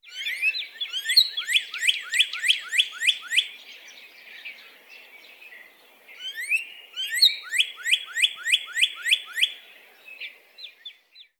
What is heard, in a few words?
Bird Cardinal